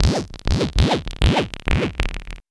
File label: XPANDER; AMG - Vince Clarke Lucky Bastard